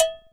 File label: Cowbell